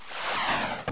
WOOSH; HELICOP

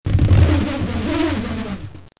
bug sound; How Many Bugs in a Box

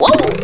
Start Jump; Fruity; GUSHERS

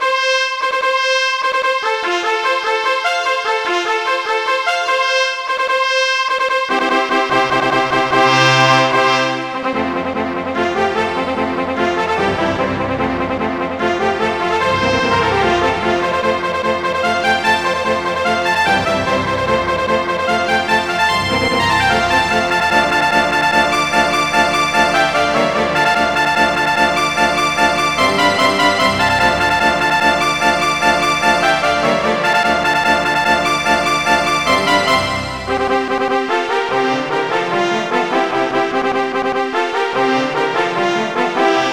MELODY

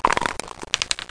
dice

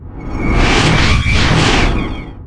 explosive saber; Earth and Beyond; EA Games

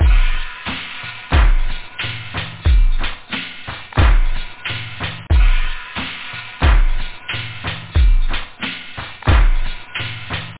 Disco; da capo vol1; loop house

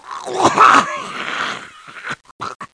vomit; GEOGRAFIA

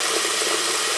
bathroom sink running loop; hotel giant demo

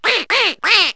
quack, animals; Wired for Sound Pro CD; Aristosoft, Inc.

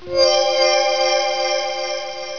start

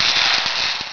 spark

